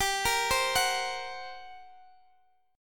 G Minor Major 7th Double Flat 5th, G, down, Guitar, Open D